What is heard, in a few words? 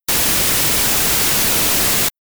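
Synth Filter Low shelf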